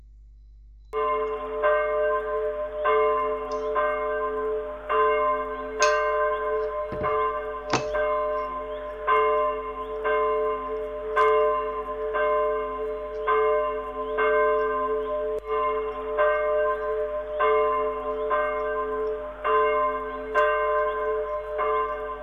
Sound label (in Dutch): klok sint anthoniepolder; Dorpskerken in de Hoeksche Waard